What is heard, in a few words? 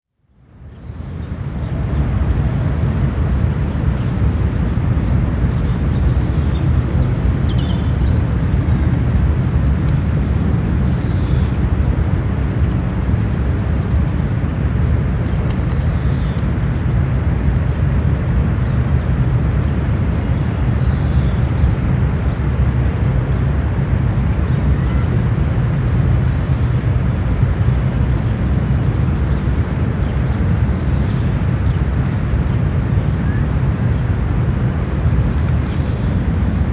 Spring afternoon atmosphere in park with birds and distant heavy traffic; Outdoor Sound Effects; BBC